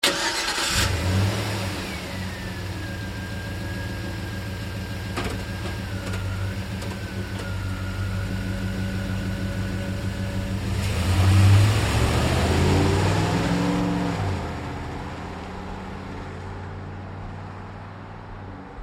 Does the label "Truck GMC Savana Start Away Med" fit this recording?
Yes